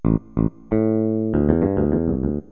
Pick Bass

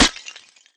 grey block; Geschicklichkeit; Bricks Of Egypt

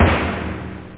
pop; drums; snare

